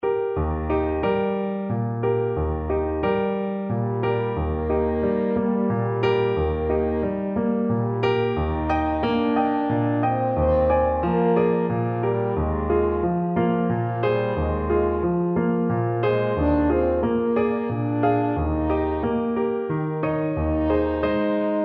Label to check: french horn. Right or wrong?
Right